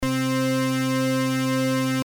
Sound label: Noise Lead vco